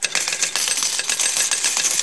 Coin out

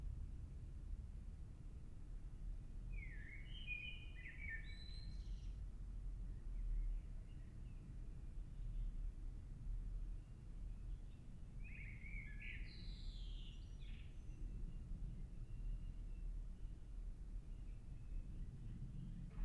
Bedroom Roomtone Evening

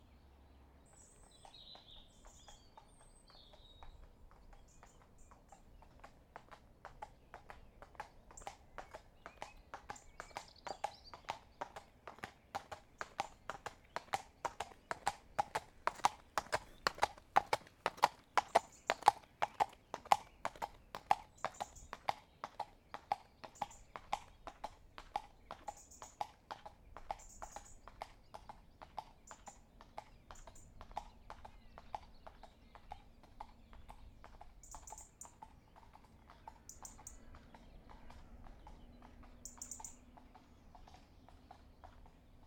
Horse going by

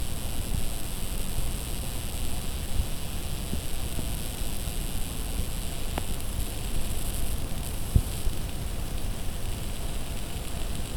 Candle Buzz

